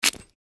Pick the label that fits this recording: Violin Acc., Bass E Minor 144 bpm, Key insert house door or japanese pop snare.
Key insert house door